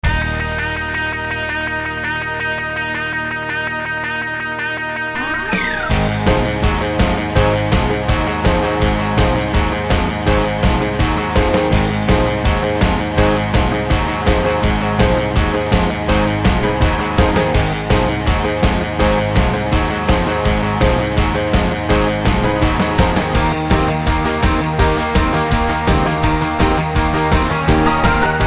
SINGBACK; ENGLISH

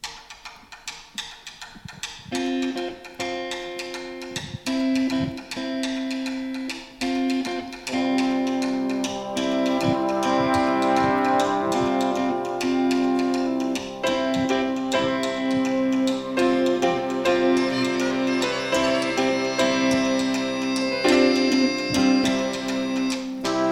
SINGBACK, BULGARIAN

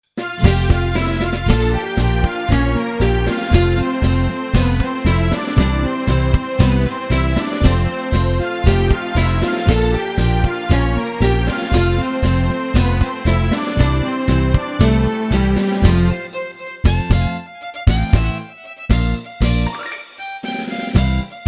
TANGO, SINGBACK, BULGARIAN

